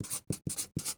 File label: Write on Paper with Marker; Office Supplies